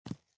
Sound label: SFX run rock